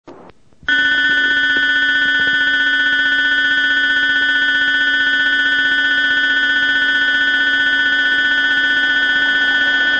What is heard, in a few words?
bell